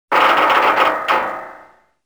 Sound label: metal stress, ambience; Soldier Of Fortune II Double Helix; XBOX